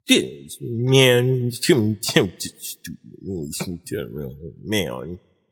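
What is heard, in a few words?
nonverbal grumble; smite; voicepack; merlin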